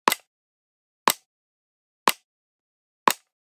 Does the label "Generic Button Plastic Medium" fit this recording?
Yes